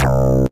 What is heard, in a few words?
Synth Bass; puchicon; dsi ds